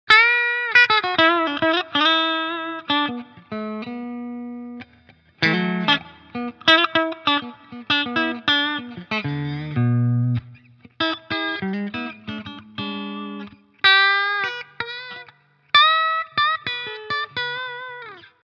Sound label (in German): clean Stellung 4; Charvel Pro-Mod DK24 HH FR